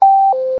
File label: Radio, radio effect